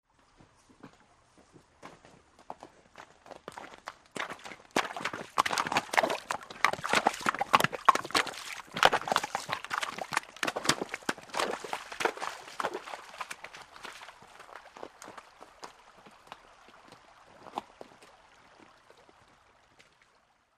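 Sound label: Horse Through Shallow, water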